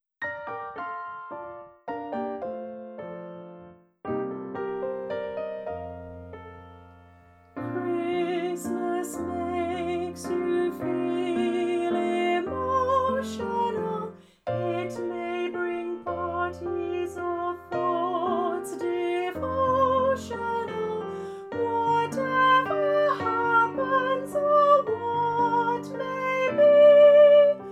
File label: vocal